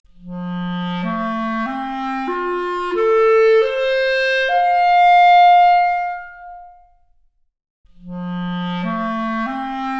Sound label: Clarinet arp, Stereo output noised; 3bit